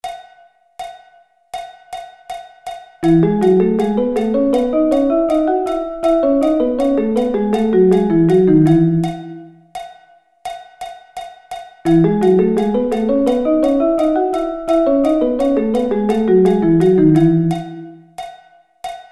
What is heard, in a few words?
E Thirds